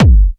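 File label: Cool bass drum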